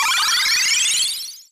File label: robot startup